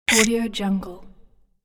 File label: short pain critter monster voice